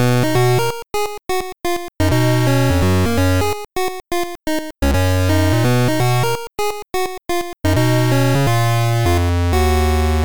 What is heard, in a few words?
8bit